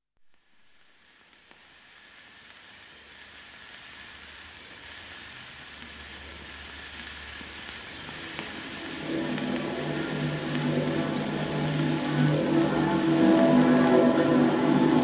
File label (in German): Glocken Klang Glocken